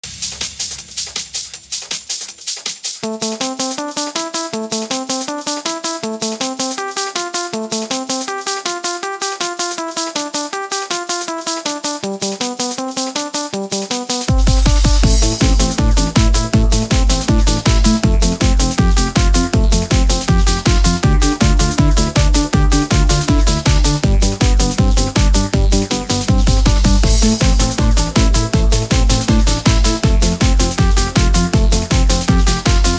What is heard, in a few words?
Techno Dance 90s